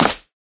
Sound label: tank heel click; taunt; player; video games; source engine; tf